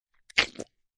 Knife In Flsh, A knife stabs into flesh; Stabs and Slices; Sound Effects:Combat

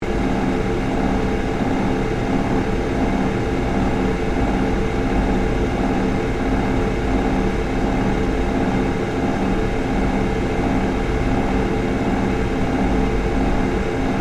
Industrial Fan Motor, Run, Loop; Factories; BlastWave

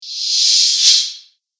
Thin Reverse Whoosh; Whoosh Sound Effects

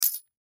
Tambourine Wood; Musical; Blastwave

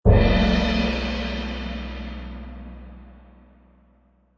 orchestral drum hit; Drum Loops

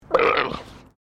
Animal Alpaca Female Grumble; Alpacas on a farm in Gippsland Victoria; Miscellaneous Animal

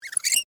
Metal Hinge Squeak, Foley, Metal, Hinge, Squeak; Latches; Objects

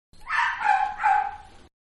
Barking Inside Distant, Dog; Animals; Dog-Barking-Inside, Distant; Dogs

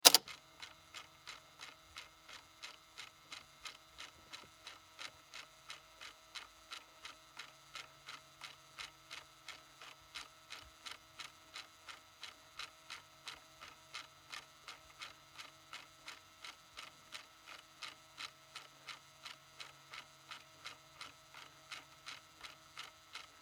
Technology Cassette Player Press Play Run Stop; Tape Players

